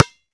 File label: Street Ball Bounce Concrete; SFX Kit; Street Basketball